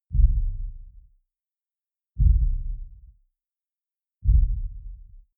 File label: sub impact short distant rumble soft; drones